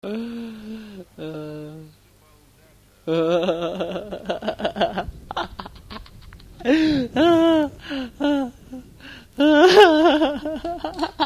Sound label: laughter